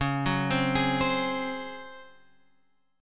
C sharp Major 7th Suspended 4th C sharp Guitar Open G